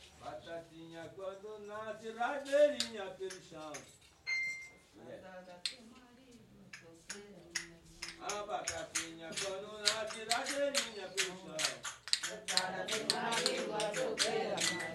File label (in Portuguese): fanti baiao de princesas batatinha; Baião de Princesas é um ritual especialíssimo e único que acontece apenas uma vez ao ano